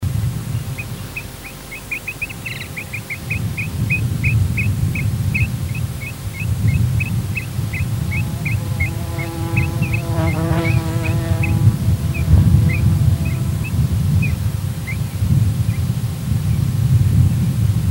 Eurasian Dotterel

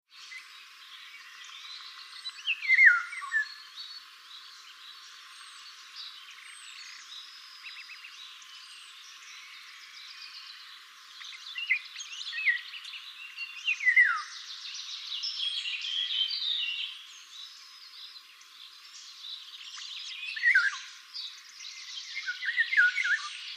Golden Oriole

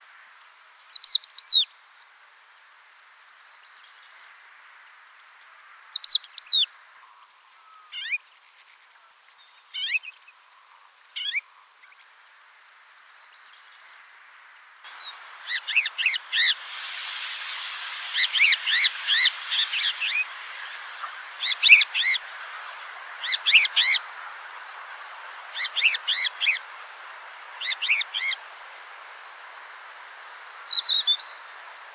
Crested Lark

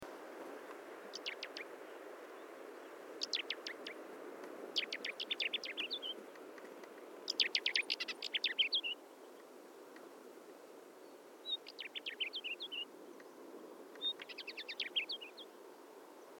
Northern Wheatear

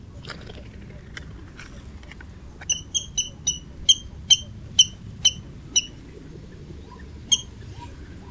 Water Rail

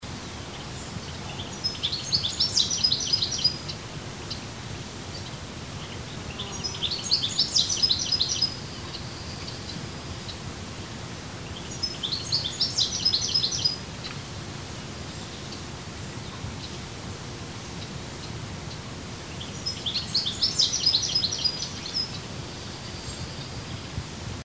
European Goldfinch

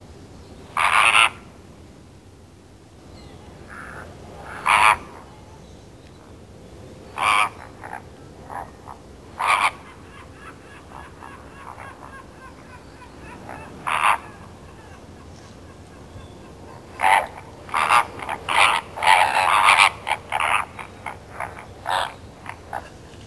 Flamingo

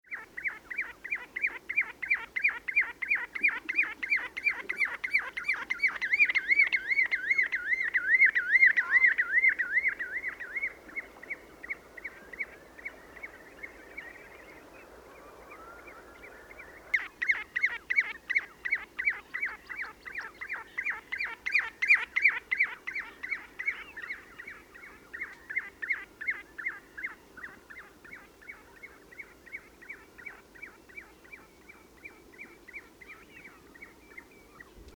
Ringed Plover

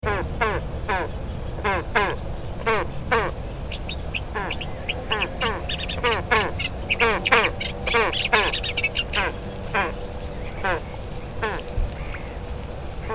Great Crested Grebe

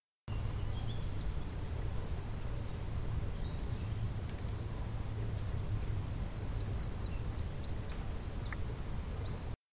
Kingfisher

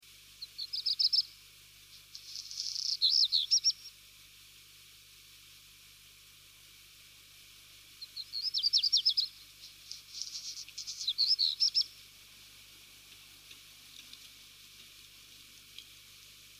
Black Redstart

